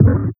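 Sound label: Darkness Kick, drum kit; Sonic Foundry (Sony Creative Software); Esoterik Beatz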